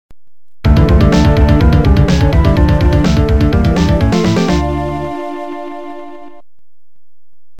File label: All Clear; Castlevania